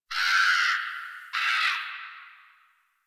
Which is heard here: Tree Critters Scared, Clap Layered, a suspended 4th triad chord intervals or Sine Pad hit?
Tree Critters Scared